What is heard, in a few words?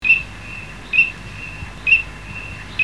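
common teal voice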